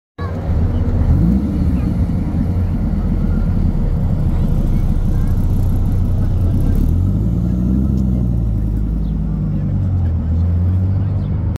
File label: Dodge Viper